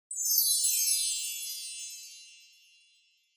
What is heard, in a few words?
Wind Chimes Fast Down High